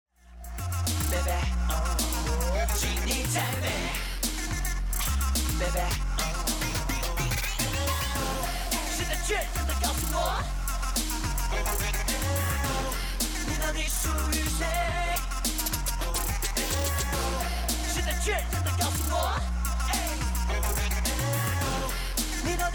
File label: bomb planted; music kits custom